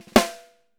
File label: 6X12 ALUMNUM, Living Drums